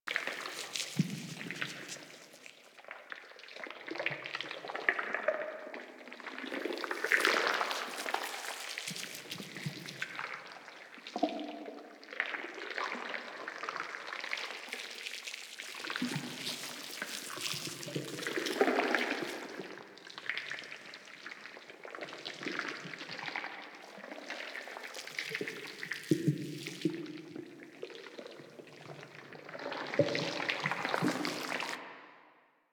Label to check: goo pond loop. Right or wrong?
Right